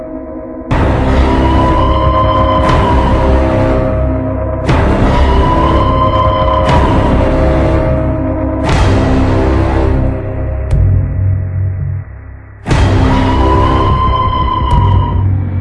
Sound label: Space Gate; cstrike; CommunityBlock